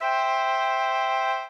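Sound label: Maj Ob Cl Fl, major oboe clarinet flute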